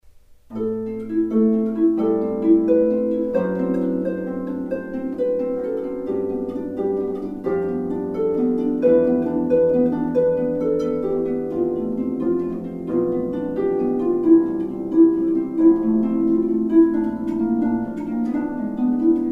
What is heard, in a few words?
Four Miniatures for Harp Prelude